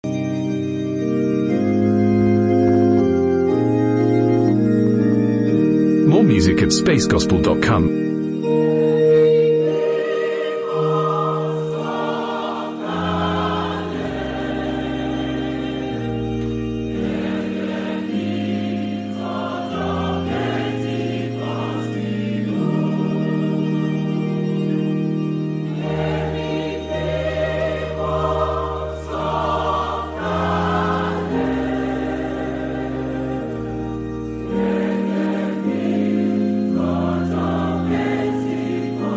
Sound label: Choir, hymn; HYMN 236 EMI MIMO SOKALE; CCC INTERNATIONAL HEADQUARTERS